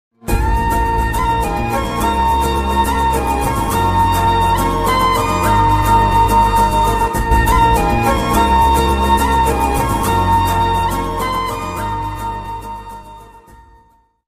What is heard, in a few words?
hindi movie background song ringtone; venky mama